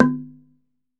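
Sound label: TUNG LO, Tongue Drums, Pitched Percussion; E-MU Producer Series Vol. 7 – Old World Instruments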